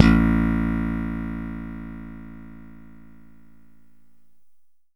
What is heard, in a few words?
E. BASS L, Korg DDD5